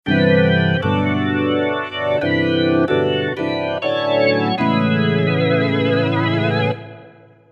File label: Slow Rock B3